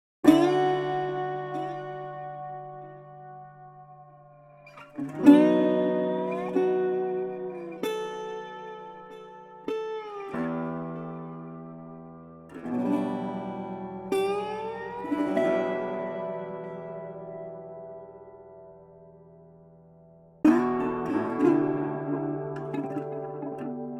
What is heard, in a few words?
Street Guitar Blues Atmospheric 95